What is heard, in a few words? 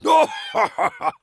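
laughter big, French; Rire gras 2; Heavy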